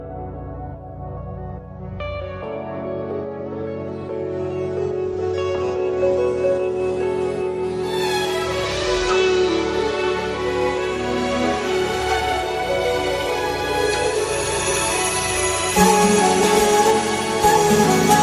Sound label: Samsung Galaxy Note 10